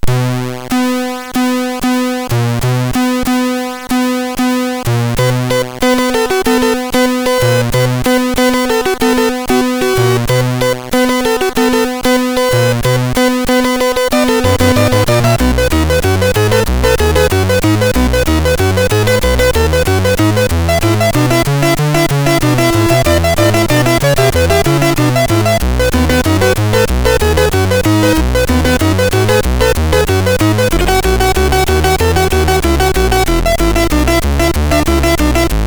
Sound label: Hip Hop, sid MOS6581R3; Mermaid; Early Tunes